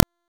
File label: Cloak Remove; Warframe; Frumentarius